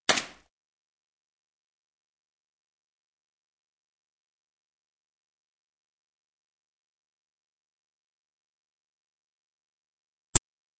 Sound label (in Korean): Fights, 싸움-전투; 헐리웃특수효과음